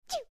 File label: sneeze